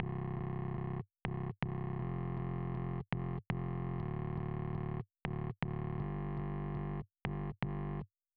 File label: bass